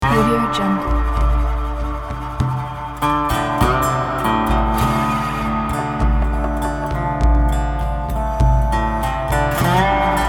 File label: western slide guitar loop